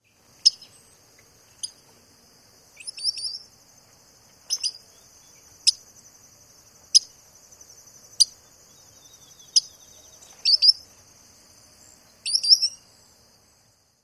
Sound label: calls